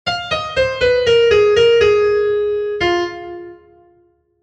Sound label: bluenote